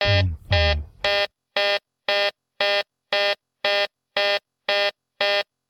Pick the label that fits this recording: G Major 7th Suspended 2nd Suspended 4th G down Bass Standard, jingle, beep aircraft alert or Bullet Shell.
beep aircraft alert